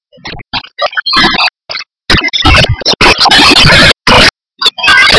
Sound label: Computer mouse